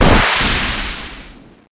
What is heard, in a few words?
Missile Launch; Learn to Fly 3